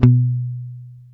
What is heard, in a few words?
B 3, go-go mute, bass; Spectrasonics; Bass Legends CD 1